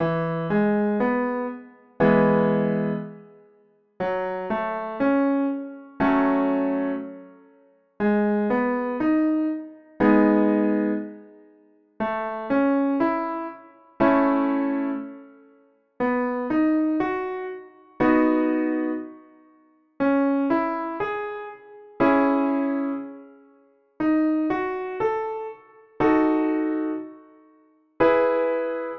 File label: The Chords Of E Major